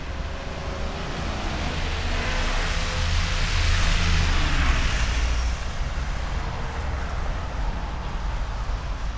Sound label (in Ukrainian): Звук трафіку на Хрещатику